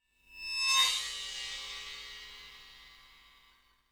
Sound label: cymbal rub, gretsch; Dirt-Samples